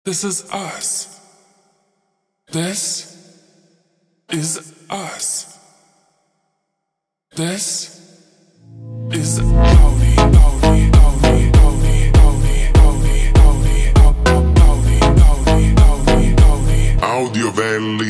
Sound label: Funk ultra Slowed